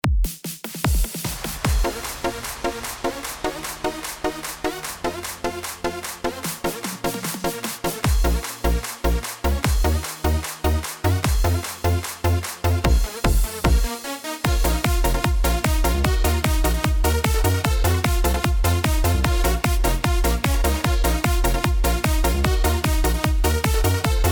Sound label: Midi Yamaha; yamaha genos